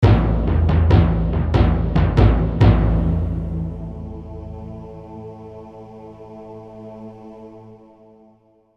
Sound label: Orchestral Phrase; FXHome